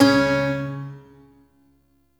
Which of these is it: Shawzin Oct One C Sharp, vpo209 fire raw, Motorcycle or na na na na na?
Shawzin Oct One C Sharp